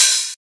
Open Hat

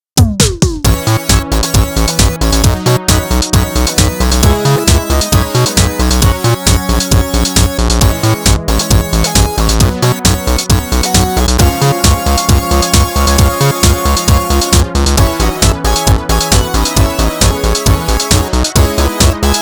original instrumental; 燃えろ！柔道一直線

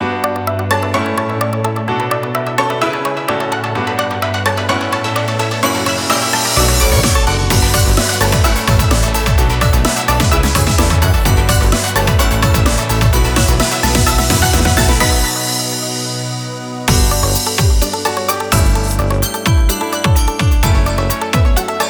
off vocal

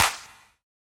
Clap

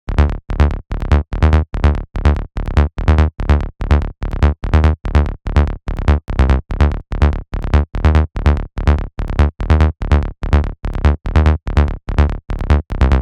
Bass Dark

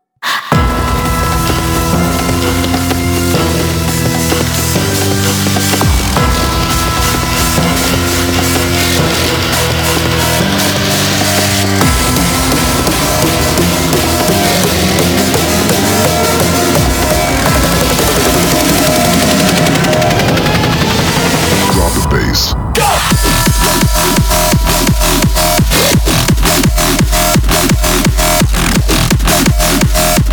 Desire Drive instrumental, EDM; 東方紅楼夢19

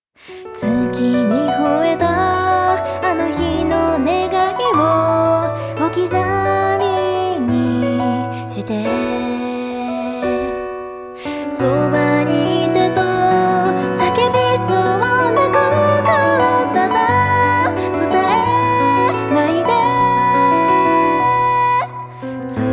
Piano Ballad ver.

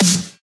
Snare

